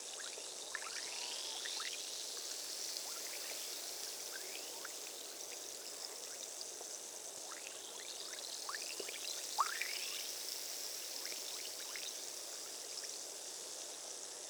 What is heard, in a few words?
acid ambient loop